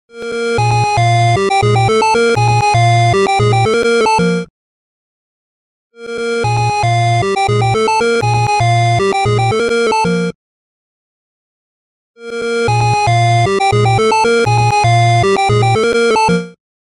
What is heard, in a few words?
arcade clock alert ringtone